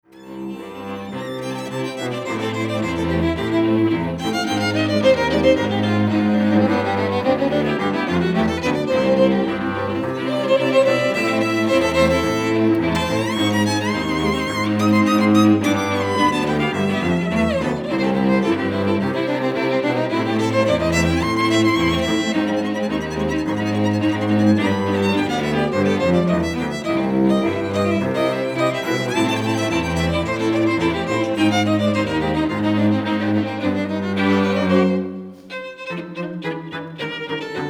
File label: strings; carnevalstrings